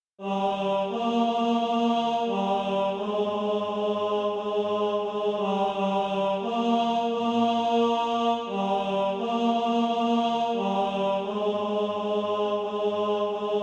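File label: tenor; SATB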